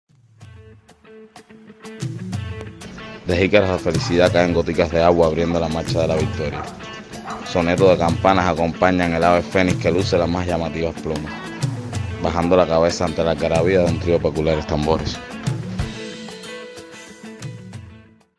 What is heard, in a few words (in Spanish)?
la dansa de los tambores